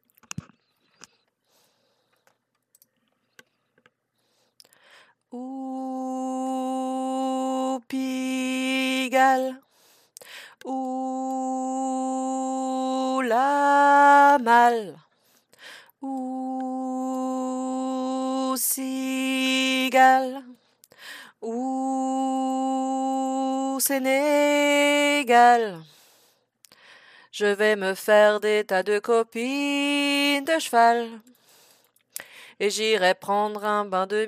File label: alto; Piste alto